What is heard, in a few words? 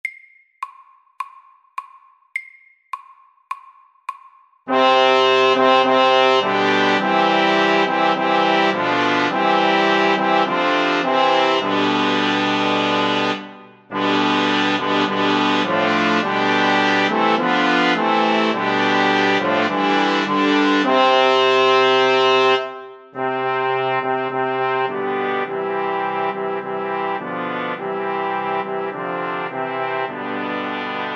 Fanfare for tre MEDIUM 104